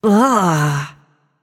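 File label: ugh, female voice; smite; voicepack; hera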